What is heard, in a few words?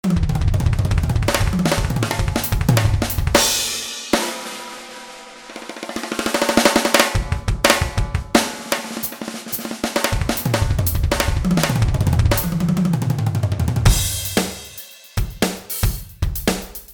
Studio drums